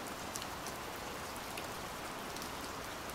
rain; Minecraft; Votive World

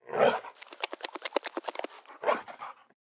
shake, wolf; mob; Minecraft; Votive World

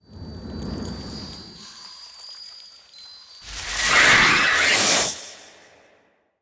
swoop, phantom; mob; Minecraft; Votive World

